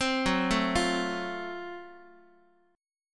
F sharp Minor Major 7th Flat 5th F sharp down Guitar; arpeggiated